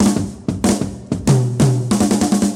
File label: Drum loops, Rolls